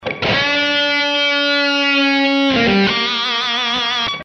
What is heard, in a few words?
G29 98 blues lead